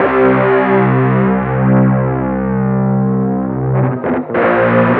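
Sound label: distorted guitar; USB Soundscan vol.31; Miro s Grooves; AKAI; DISTO GTR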